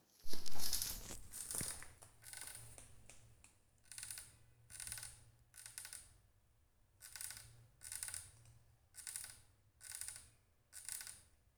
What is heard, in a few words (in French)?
insecte ronge le bois